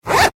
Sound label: zipper; foley